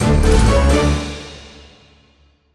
jingle objective complete; Seven Seas Solitaire

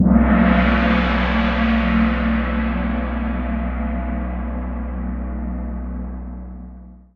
Hi Gong

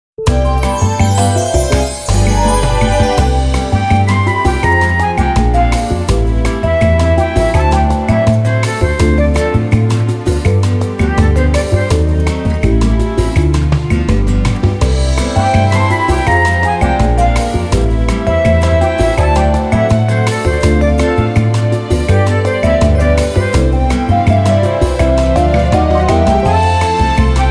Music Menu event

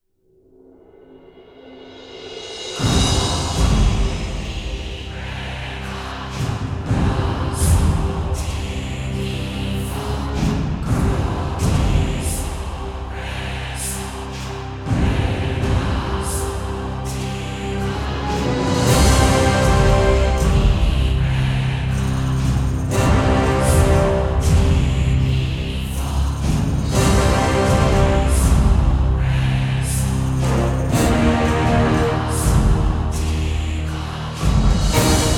epic scary; Hell Warders; desecrated sanctum

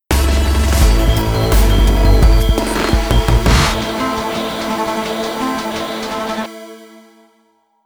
Boss Defeat Jingle; rive wreck hack die retry; ps4

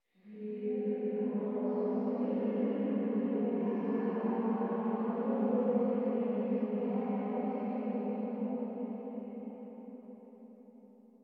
ambience chanting mantra; Rage in Peace

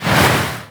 Footstep; Footstep; kids park kaitou sentai lupinranger vs keisatsu sentai patoranger; Hawksmom

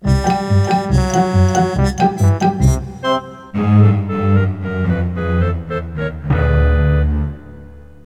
In Game Music; Animaniacs Ten Pin Alley; PS1

